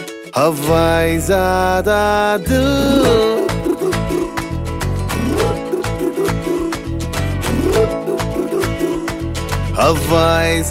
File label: ringtone bollywood ringtones